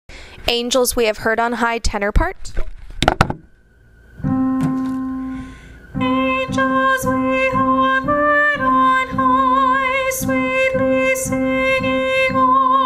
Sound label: angels we have heard on high tenor